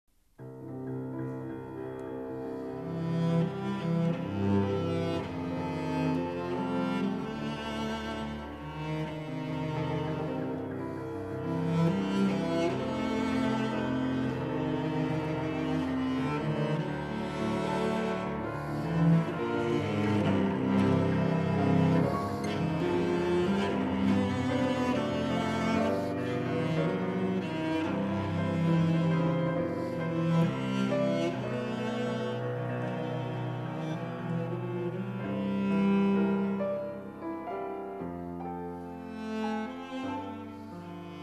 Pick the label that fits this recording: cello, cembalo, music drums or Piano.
cello